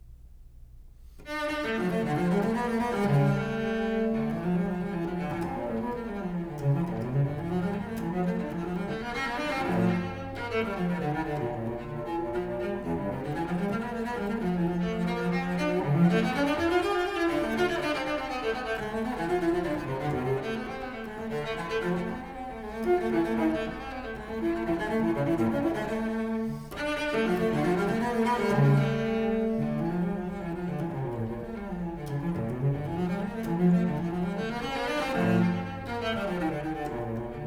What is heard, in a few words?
Suite for Solo Cello No. 2 in D minor Courante; BWV 1008; BACH